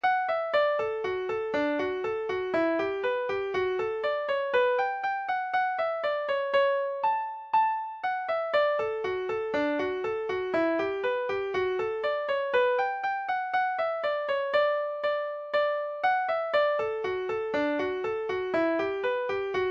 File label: railway hornpipe, folk music midi